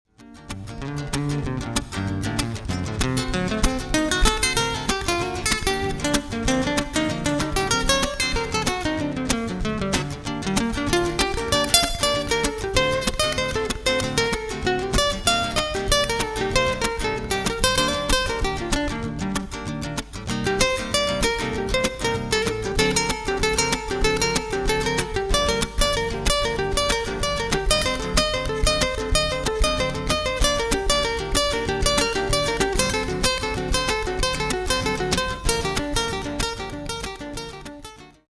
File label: rhumba gitano